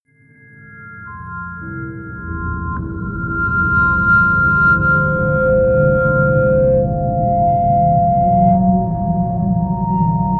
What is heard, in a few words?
drone reversed chimes